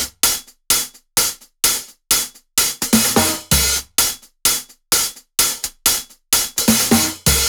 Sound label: 128BPM Big beat Kit; Vengeance Samples